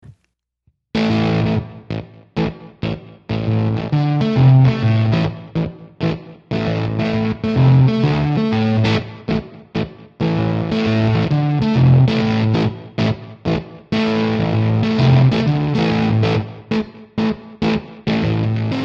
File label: Ripped Speaker Classic Ripped Speaker